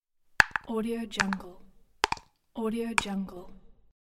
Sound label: filling dices in basket successively